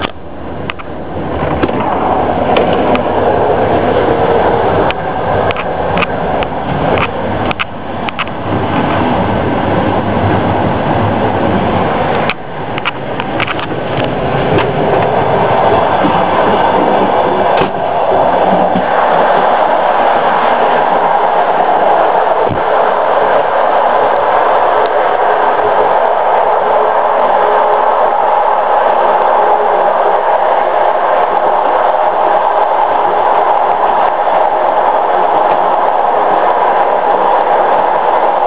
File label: Train Sounds; TransSiberian 2005